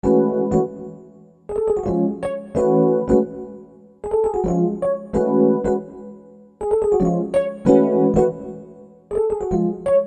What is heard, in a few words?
Keyboard